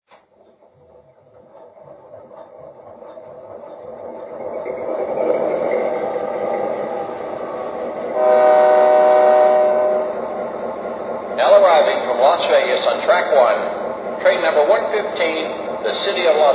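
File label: aerotrain, trains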